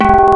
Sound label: Stir Fried, Foley; Cymatics; Foley Wavetables Vol 1; Serum